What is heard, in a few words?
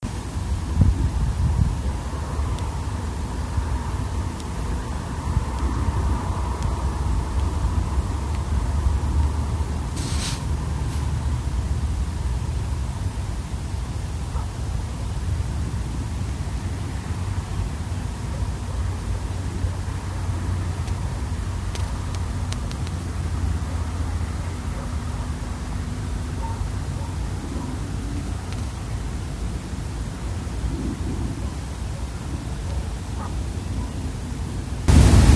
Gedling country park